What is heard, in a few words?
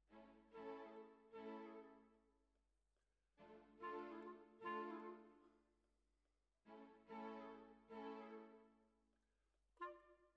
Bassoon, Jazz for piano